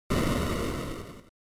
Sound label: NES General Noise Single